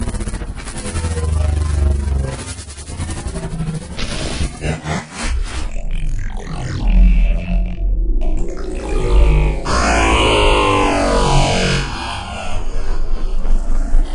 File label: Transformers sound